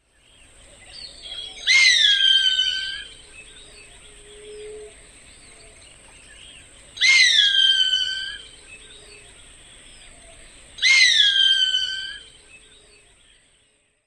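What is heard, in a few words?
calls, bird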